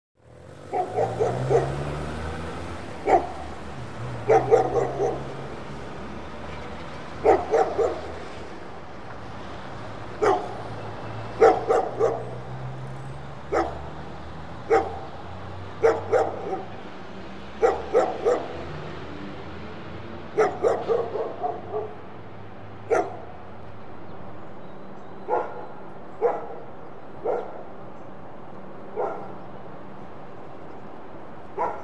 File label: Dogs Bark Close Mediu